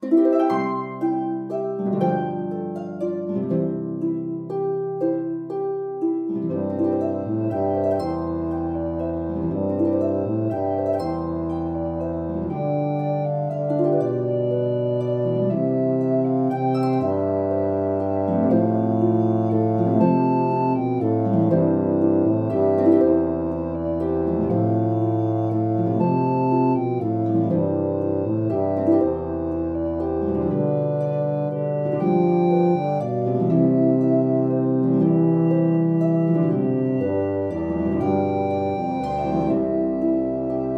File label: Tuba track with Melody; Silent Night